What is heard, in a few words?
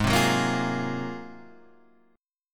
G sharp 13th G sharp, down